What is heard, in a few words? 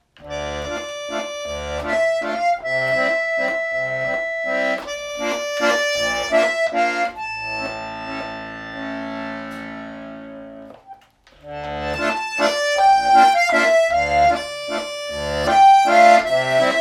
ERIKA1 440.8 valse